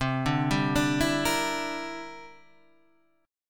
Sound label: G Minor 6th C; Gm6/C arpeggiated; JGuitar